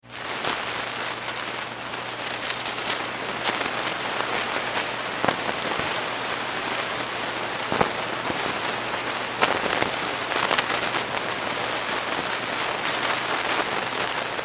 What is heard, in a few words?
Static tube amp ca1960s, Static, Tube Amp; Tube Pa Amplifier Static , Constant , Some Bursts Of Noise; Electronics